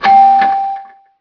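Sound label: elevator bell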